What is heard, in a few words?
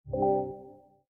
Power Connected; Honor; ui; MagicOS 7.1